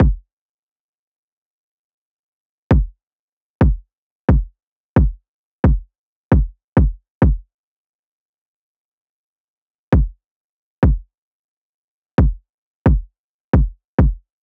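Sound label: 133, Kick Loop, A minor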